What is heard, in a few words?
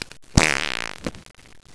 fart